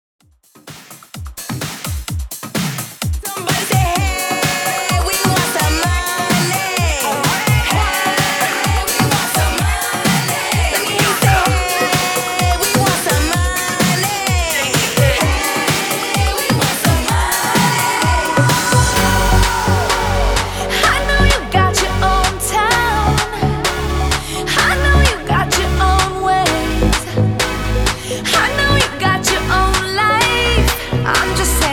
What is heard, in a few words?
Hype 128 BPM; REDRUM